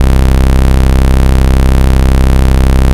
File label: Ultimate Bass Root C; Vengeance Samples